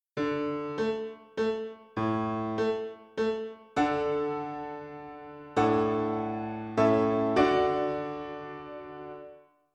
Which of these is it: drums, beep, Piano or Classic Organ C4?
Piano